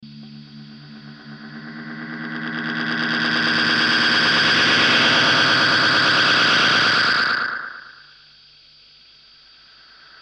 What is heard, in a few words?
Micronoise